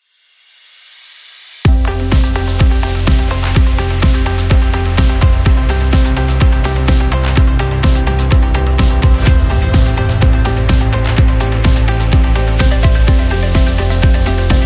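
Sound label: Learning Time, motivational corporate music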